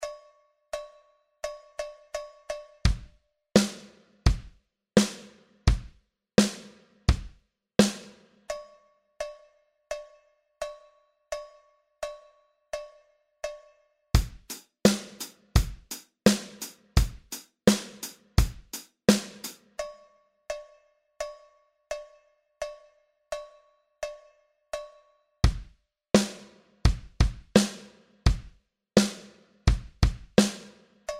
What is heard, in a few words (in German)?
Erwärmung Nachspiel Rhythmen